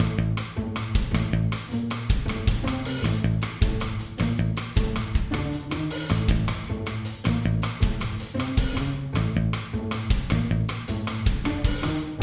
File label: Bee Hive Platforming MIXED; Ben 10; switch gamerip